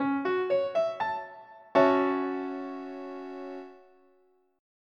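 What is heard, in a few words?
F# M7, guitar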